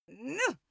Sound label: female attack